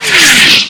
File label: artillery incoming short